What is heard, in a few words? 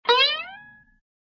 bing; cartoon; hannah barbera cartoon sound fx